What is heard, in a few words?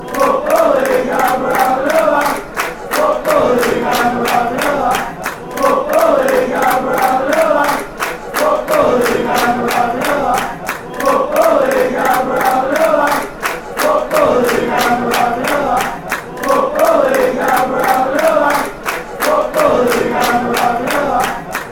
top league and were having laugh, fan chants; morecambe